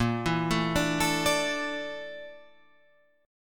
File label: A sharp Major 7th Flat 5th A sharp